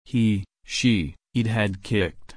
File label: kick